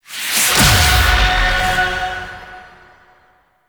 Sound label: Choir Chorus Cast Power